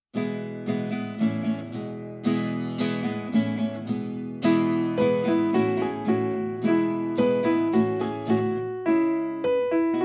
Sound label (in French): la chanson des saisons instrumental guide chant; Comptines